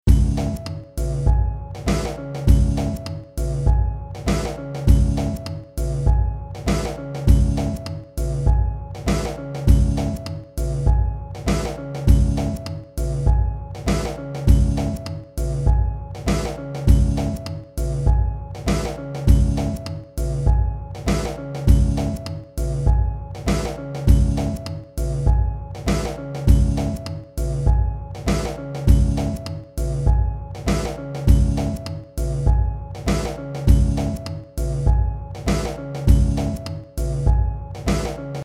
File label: C#m100 Pot Pourri Groove